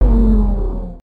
Swipe Short; ps4 windows xbox one; f1 2018

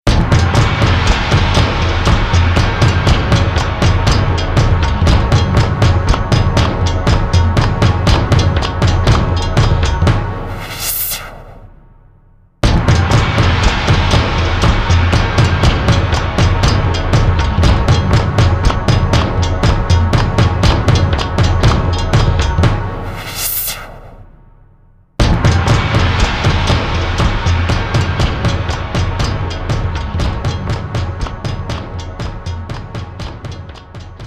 Jingle, boss intro; asterix obelix xxl 2 mission wifix 2006 playstation portable gamerip

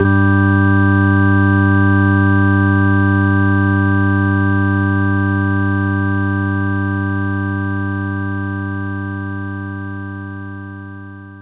organ G3; knights try

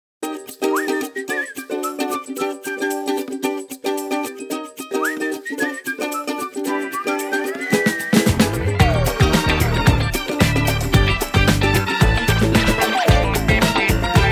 Intro Theme; Angry Birds Match

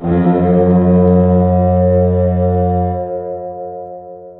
Horn

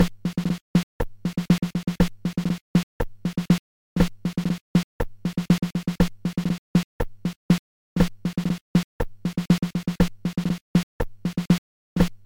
Drum; Tutorial

